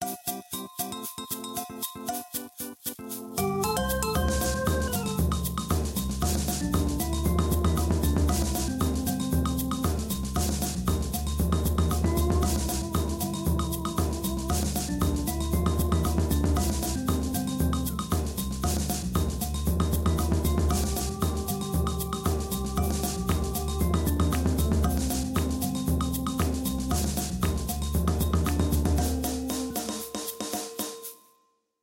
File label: Samba Limbo VERSE; SO Clips